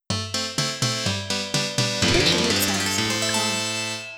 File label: Medieval Cheer